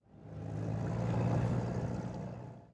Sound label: car driveby; ambient atmosphere; dust